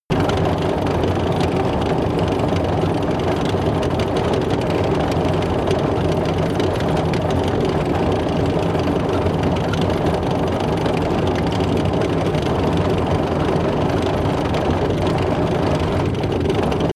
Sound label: tank small tracks rattle fast